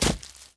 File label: footstep jump vegetation